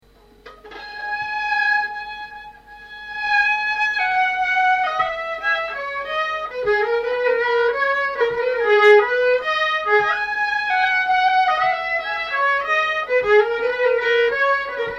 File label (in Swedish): Polska